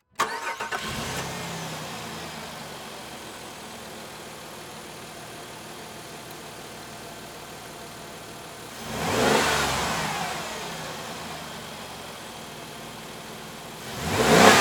Transportation Jeep Commander Start Rev Off Exterior Hood Open